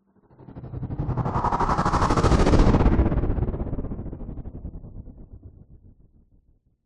sound design sweep chopper stutter fast dark chorus